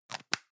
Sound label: plastic can lid screw close medium; objekte; dose